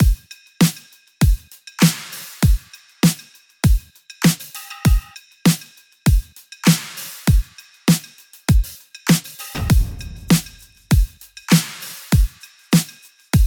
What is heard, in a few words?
Clear 99 Bm Drum Loop; Ava Prism